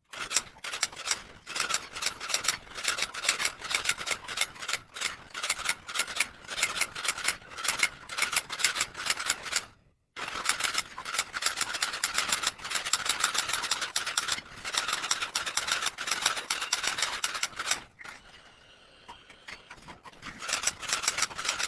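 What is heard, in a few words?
typewriter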